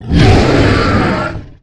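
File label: ATTACK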